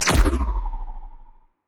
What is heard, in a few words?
healing projectile, weapons; Stellaris; biogenesis